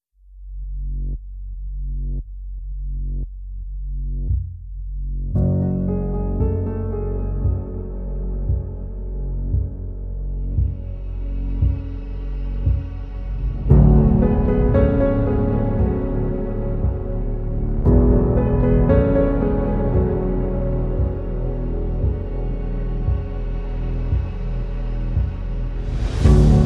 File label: Counting Waves